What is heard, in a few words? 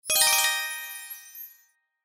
Symbol Win Scatter; slots; Steam Miners